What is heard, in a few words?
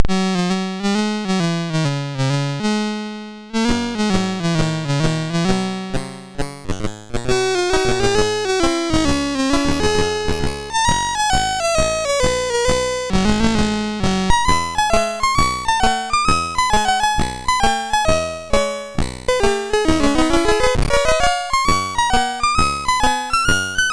Fuzz, sid MOS6581R3